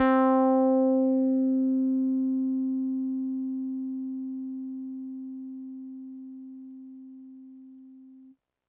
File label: Synth Prophet One Shots, Keys C; Electronisounds